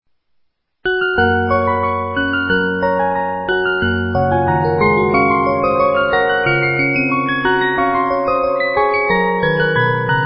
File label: Simulation, 72-Note music box; Brandenburg Concerto No 3 in G Major